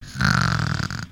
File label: Pet Cat Snore